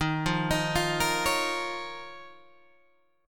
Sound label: D sharp 7th Suspended 2nd, Guitar, Open G